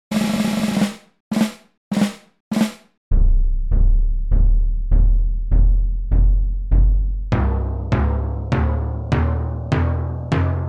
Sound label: test orchestral perc; syntheway